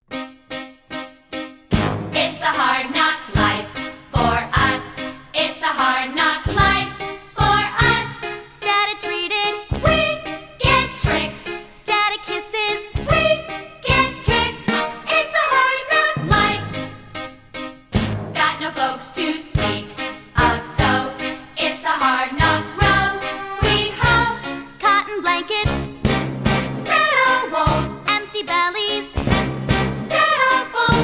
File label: voices